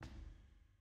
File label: Button Hover; Boba